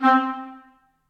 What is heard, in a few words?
Woodwinds Ensemble Staccato, Orchestral Woodwinds; Ableton